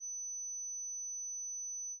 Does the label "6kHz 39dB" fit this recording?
Yes